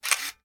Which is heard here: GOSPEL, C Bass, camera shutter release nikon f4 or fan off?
camera shutter release nikon f4